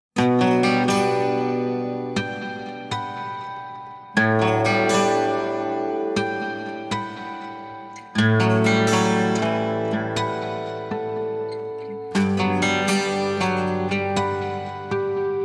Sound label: acoustic